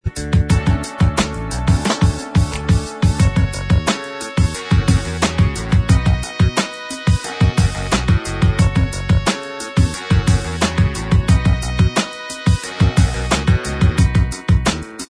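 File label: instrumental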